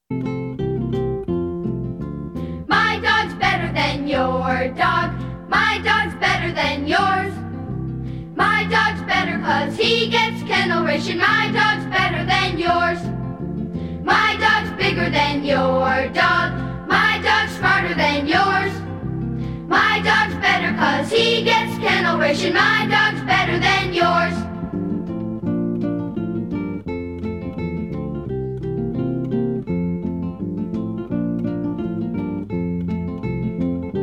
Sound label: Jingle